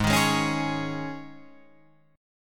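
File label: Ab Suspended 4th chord, Guitar, Drop D tuning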